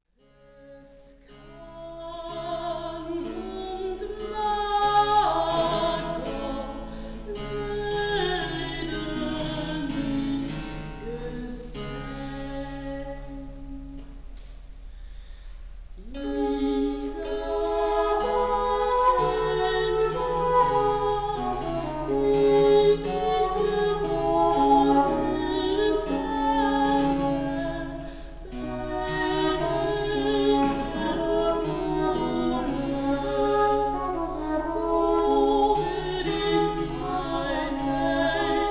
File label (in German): zink beispiel